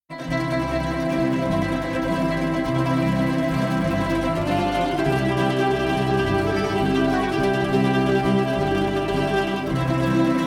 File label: instrumental